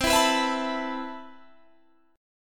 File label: C Major 13th, Guitar Open G, chord